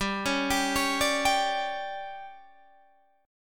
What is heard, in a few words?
D Major 7th Suspended 4th, chord